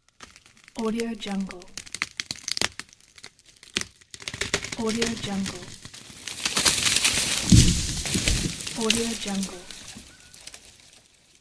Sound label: tree fall